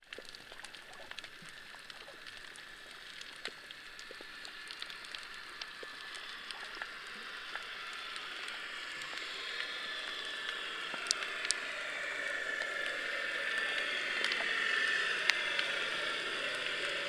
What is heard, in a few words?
transport boat passing 50m away underwater recording; Tom Chapman